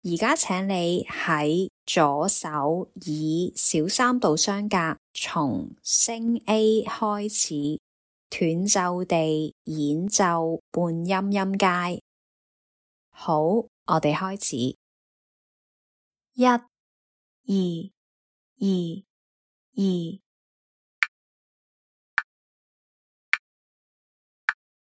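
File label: chromatic scale minor 3rds starting A sharp left hand staccato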